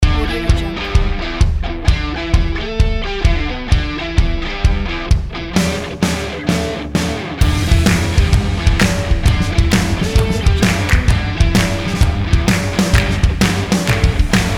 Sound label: indie rock drive energy